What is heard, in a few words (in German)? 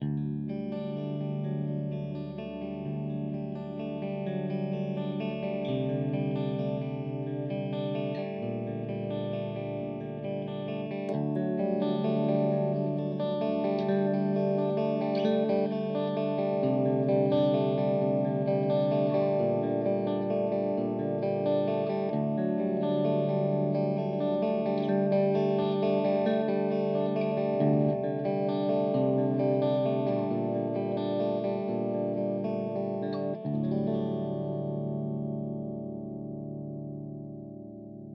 Verzerrer Pedale Transparant Ov EHX Soul Food